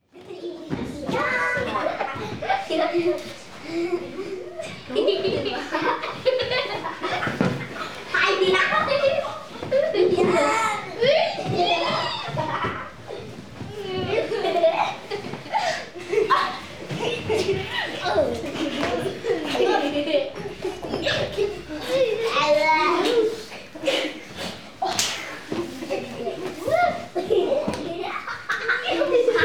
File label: Laughing Playing Children; Vocals & Wallas